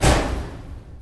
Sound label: door broke; csgo; eternity; scp; 096